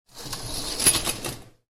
zanaves teatr

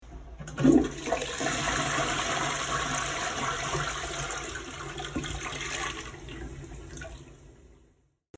sliv unitaza

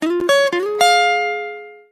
Joyful, uved huawei

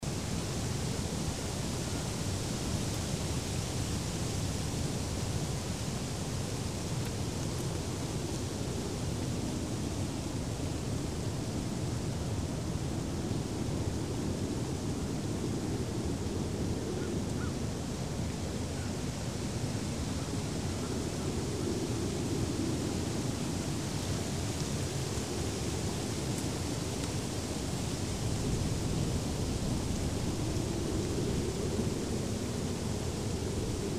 shelest listiev

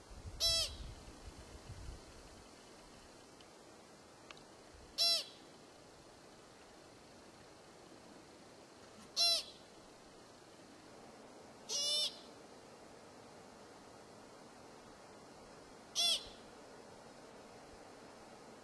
pishuha, zvuk pishuhi mlekopitayushie

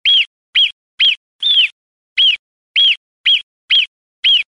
surok, zvuk surka

